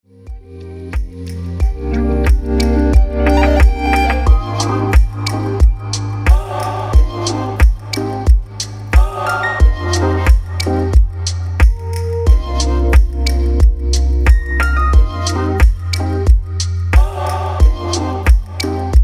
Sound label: Chillout Mood; Mylod Music